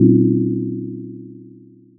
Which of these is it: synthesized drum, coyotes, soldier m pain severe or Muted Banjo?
synthesized drum